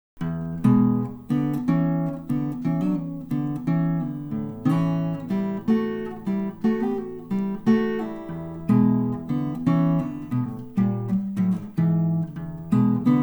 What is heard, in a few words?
blues